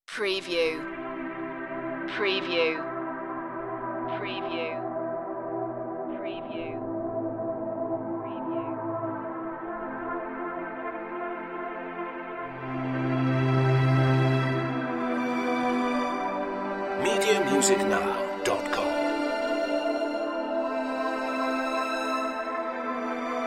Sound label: ambient dream